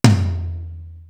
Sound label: room tom; PROC.TOMS; East West; Global Sounds